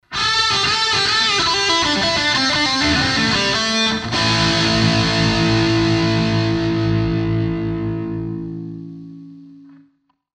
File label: guitar